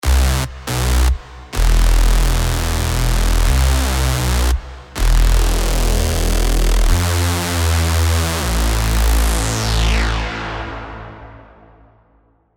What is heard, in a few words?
Reese Disruption, techno bass; Spire; revealed spire techno bass vol 1